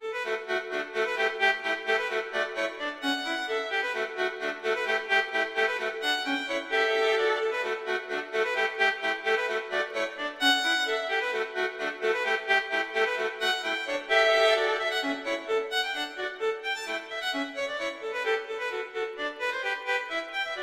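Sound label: Allegretto, violin; Mazas; Duets; Duet 3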